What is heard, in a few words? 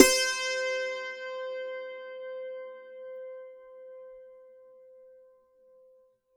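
C5, dulcimer beat; SoundBytes; Early Patches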